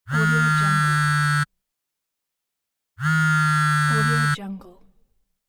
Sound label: mobile phone vibration